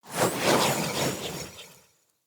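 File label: Staff Caster Projectile Whizz By; Weapons; Staves; Starter Staff Caster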